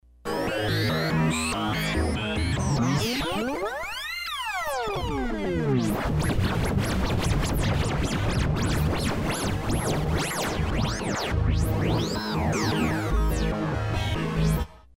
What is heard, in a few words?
Cacophony